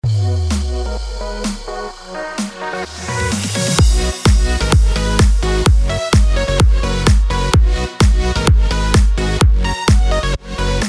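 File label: BED FUTURE HOUSE F# minor 128BPM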